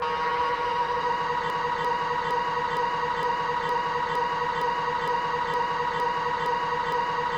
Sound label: Interdimension Synth